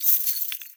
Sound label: Bird Three Body Shake; Warframe; Entrati